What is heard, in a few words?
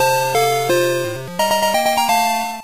Battle capture success; Pokemon Essentials; PKMN Estelar 1.3